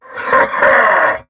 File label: Heavy Laugh; Warframe; Battle; Dax; DaxHeavy